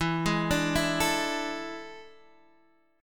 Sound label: A Major 7th Suspended 4th E